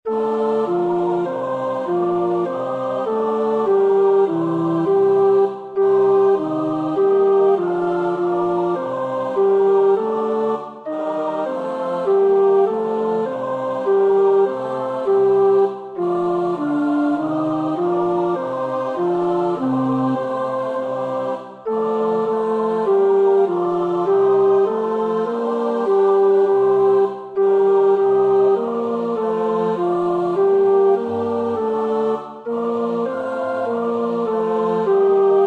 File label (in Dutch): tenor 2; Byrd (William)